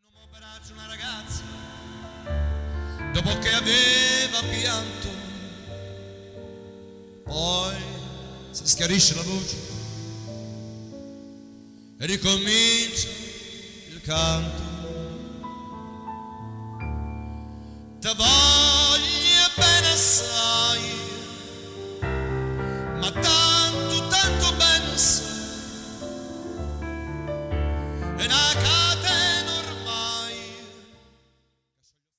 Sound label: PIANO BAR